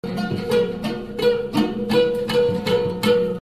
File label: loop